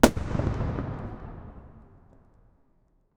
small firecracker, fireworks; mercon

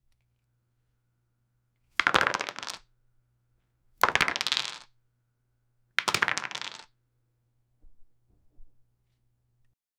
ludo dice roll; board game

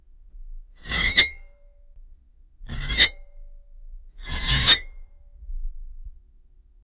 person squeezing through

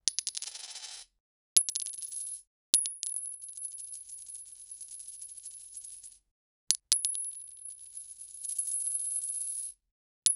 golden coins collect, money

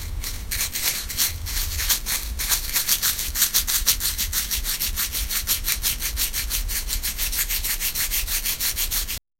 seasoning salt, sprinkle

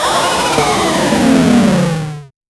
16 bit retro fantasy rpg, attack

